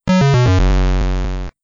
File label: Windows Command Shutdown; Windows Never Released Soundpack 2